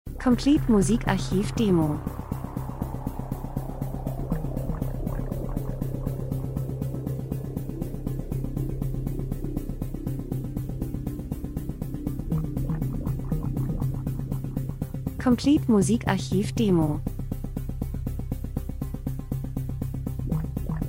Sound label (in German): drums, rhythmus; Sequenz Spannung Krimi gleichförmig, fremdartig Natur Reisefilm